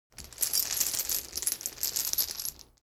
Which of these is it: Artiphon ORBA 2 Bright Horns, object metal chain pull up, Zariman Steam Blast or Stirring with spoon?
object metal chain pull up